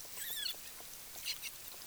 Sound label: long call